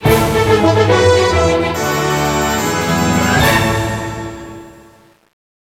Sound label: Fanfare Win; Yu-Gi-Oh! World Championship 2007; DS